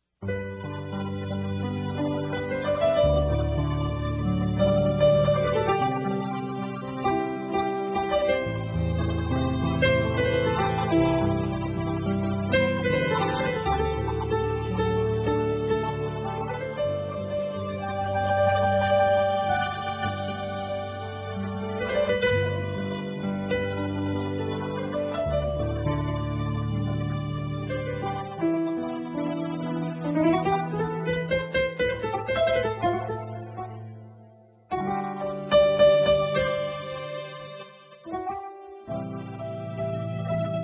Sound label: Solo piano